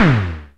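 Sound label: electronic tom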